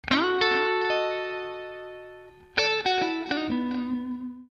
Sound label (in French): delay